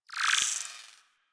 Tech One Off, Ambience; Warframe; Rail Jack

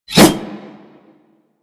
Tonfa Whoosh; Warframe; Tenno

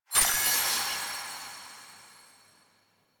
Choice Menu Hover; Warframe; Jade Shadows; Quests; Ending Diorama

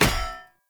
Polearm Medium Impact Default; Weapon Impacts; Player; Environment; Sphere Polearm

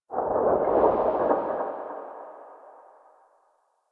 Operator Wraith Void Move; Warframe; Focus; Powers; Wraith Movement

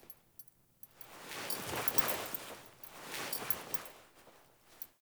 Foley Emote Bow, Clothing; Characters; Player

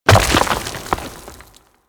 Arachnoid Camper Step Rock Debris; Warframe; Corpus

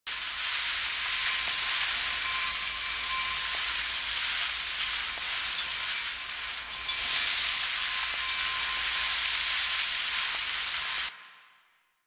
Sand Tube Loop; Warframe; Ambience; Entrati

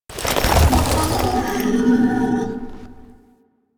Horse Gallop Brakes; Foley Actions; Warframe; Vehicles; Duviri

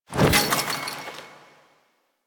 Customization UI Sel Menu; Kahl; Warframe; Veilbreaker

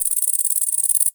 Insect Close; Ambience

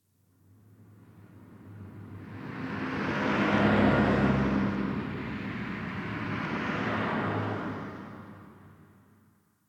Distant Traffic; Warframe; Ambience; Nineteen Ninety Nine

